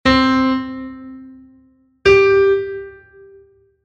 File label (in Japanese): 音当てゲーム（ソ）